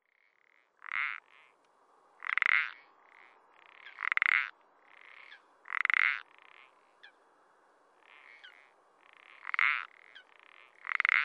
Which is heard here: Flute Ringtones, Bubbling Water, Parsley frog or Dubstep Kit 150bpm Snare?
Parsley frog